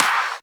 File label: wet clap